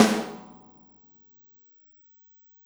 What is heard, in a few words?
Snare Shard; ZeroGravity Drum Shards